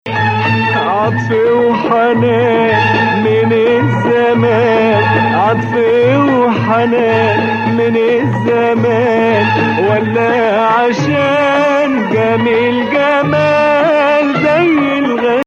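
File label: Nawa Hijazkar, Arabic music; Nawa Athar; Hijazkar 5; Maqam Nawa Athar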